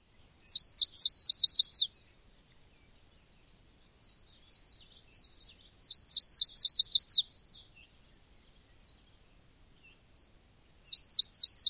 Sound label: songs